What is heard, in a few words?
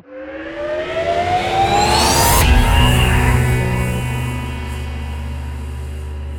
Transition End